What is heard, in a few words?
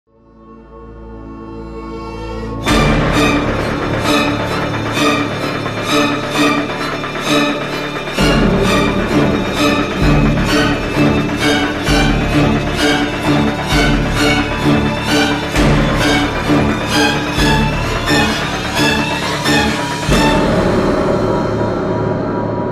Horror Scene Full Mix